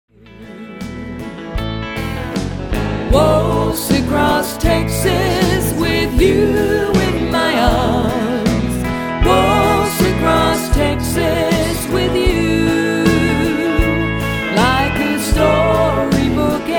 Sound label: waltz